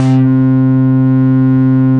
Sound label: Synthesizer Middle C Notes, Lead